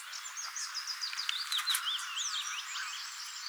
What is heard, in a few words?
willow warbler; summer leys